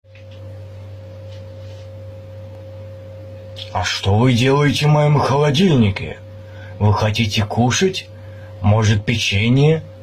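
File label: kholodilnik, refrigerator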